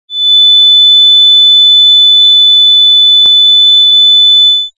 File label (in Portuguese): alarme sonoro